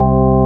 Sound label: KEY, Organs; Club-50 - Foundations Roland